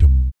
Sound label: BASS DUM; Spectrasonics; Vocal Planet; Jazz FX